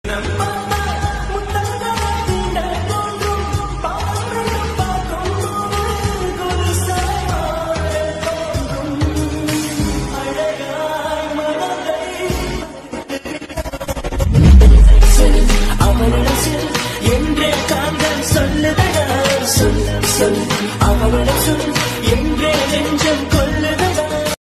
song tamil love music

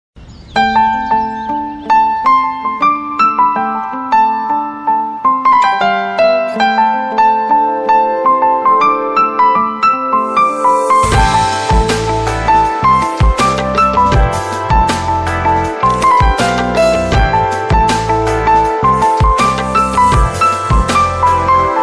ringtone background music; ruposh movie; haroon kadwani; kinza hashmi

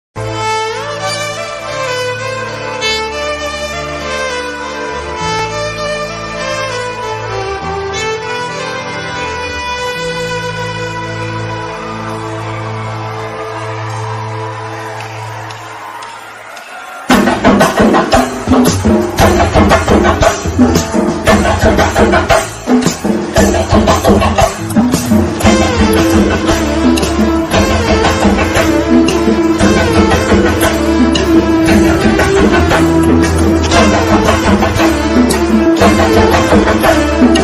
song ringtone tamil ringtone bgm ringtone

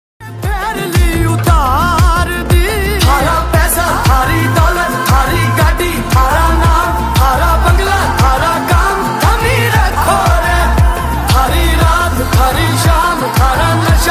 lofi ringtone slowed reverb ringtone

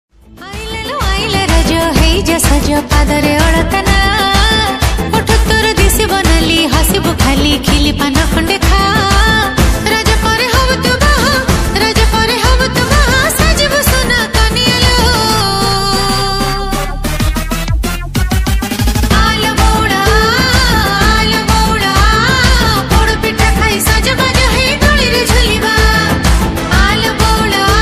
odia raja song; happy raja

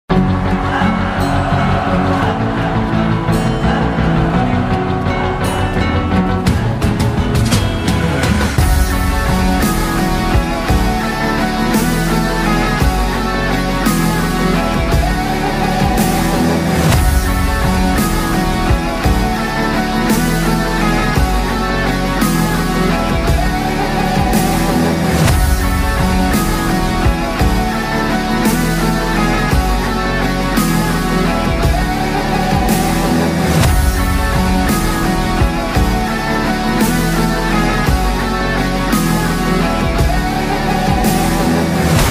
trailer bgm; jersey; mobile ringtone; shahid kapoor mrunal thakur

